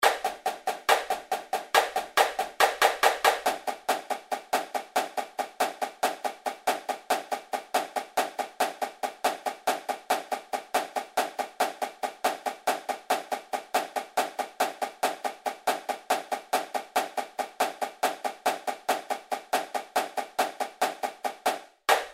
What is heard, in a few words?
Accent Tap 140 bpm Snare